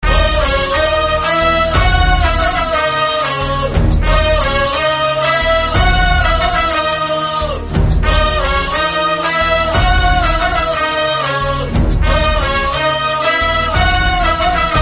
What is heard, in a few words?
marathi instrumental music bgm